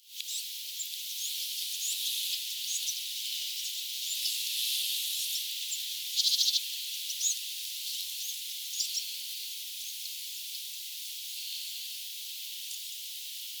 ilmeisesti talitiainen vaikka aani tuo ensin mieleen vihervarpusen; rannikon pikkulahti keväällä

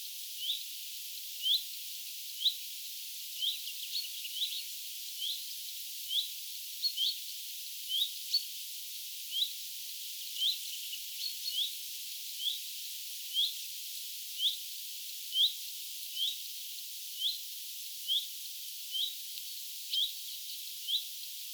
olettaisin leppalinnun hyi-aania ne ovat lahes samanlaisia kuin peipon aanet; tässä on myöskin tietääkseni leppälinnun hyit-ääniä

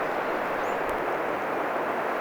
tuollainen rakattirastaan lentovihellys

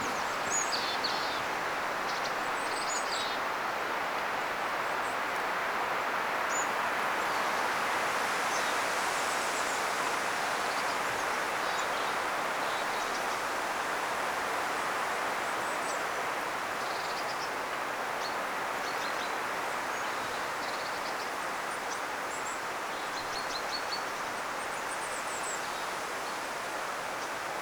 taman aanityksen aikana kuului pari ihan kuin kuukkelin aanta

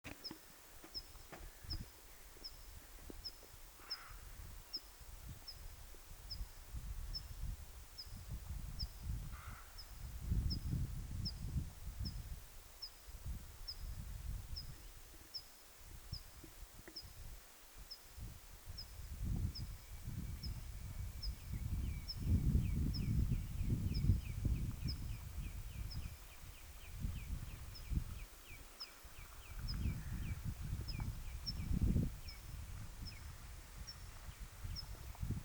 emometsakirvislinnun huomioaantelya

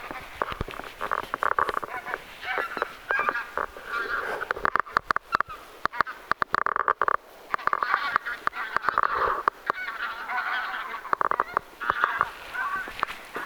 onko tuo tundrahanhi

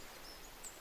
tuollainen tiaisen aani ilm sinitiainen tai ehka talitiainen; mikä rastaslaji?

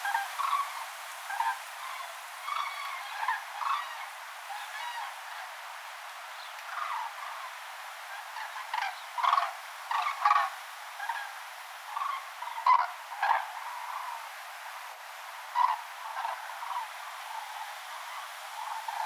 kurkiparven aantelya

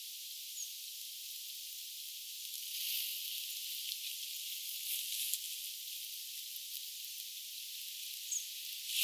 mikä laji onko se se sininen koiraskeltanokkarastaslintu vai mikä laji, voisiko olla tuo kuvien kiiltävä rastas ehkä

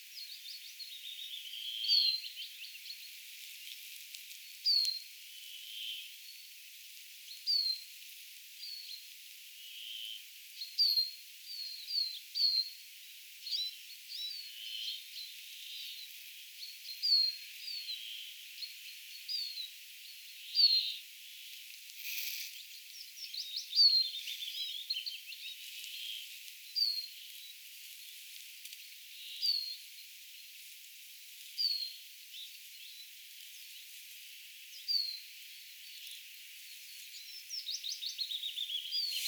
tuollaisia vihervarpusen ääniä

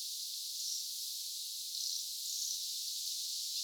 tuollaisia kulorastaslinnun aania

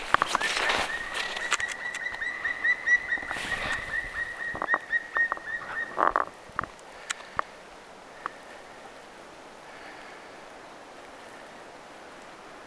palokärjen soidinlaulua lennossa lauloi noustessaan lentoon, palokärjen soidinhuutoa sen noustessa lentoon; Lauloi siis lennossa.

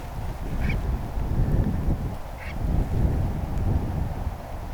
aika selvä suippopyrstökurppa

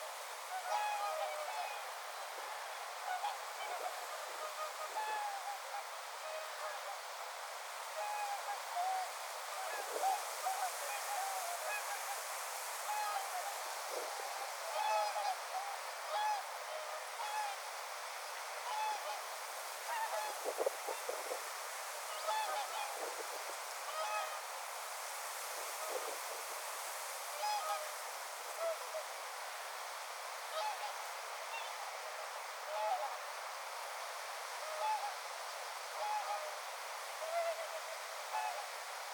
joutsenet aantelevat rannalla; samalla tavalla kuin joutsenet myohemmin syksylla

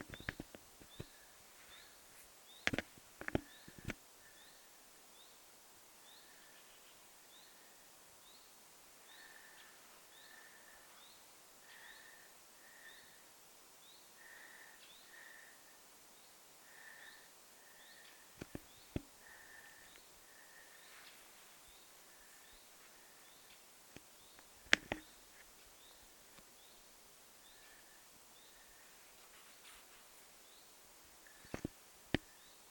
yleisin tiltaltin aanityyppi

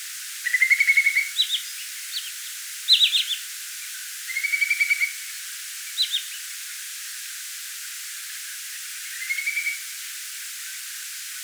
lyhytnokkainen pikkukuovi

